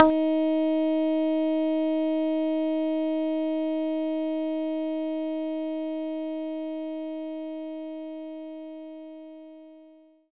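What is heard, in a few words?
Muted Guitar D sharp 4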